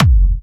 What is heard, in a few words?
hard bass drumz